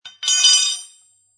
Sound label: Tire Tool Place Down On Concrete, Foley, Tire Tool, Metal, Placed Down On Concrete, Metal Ringing After Impact; Set Down